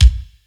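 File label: BD, bass drum; Crypton - DrumBase5000; CD DRUMS #2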